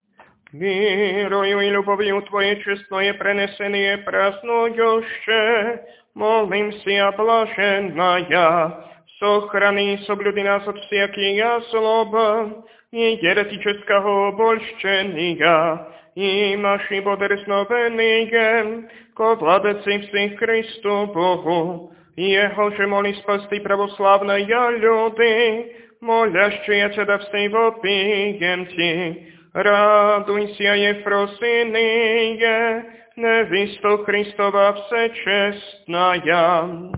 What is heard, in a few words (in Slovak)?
Kondák hlas 8; Kondák Eufrozine; nedelna-skola